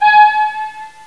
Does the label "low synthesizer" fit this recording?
Yes